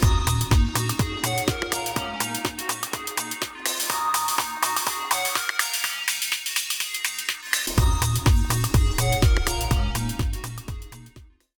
Hochpassfilter

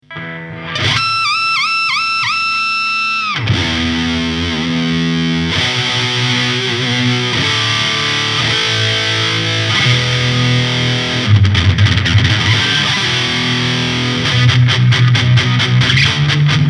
Steg verzerrt; Ibanez RG5320

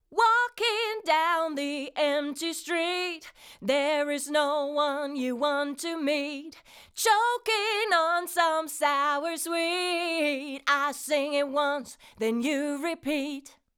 Vocal 3 zu 1 max. GR 8 dB AIR 2; Golden Age Project Comp 554 MK II

